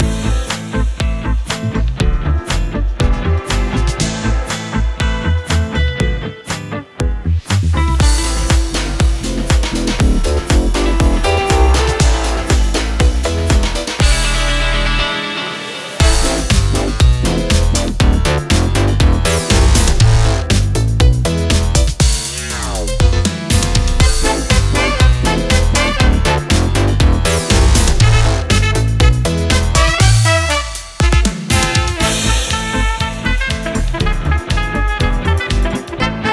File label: Music Pack Electro Swing; Fortnite Creative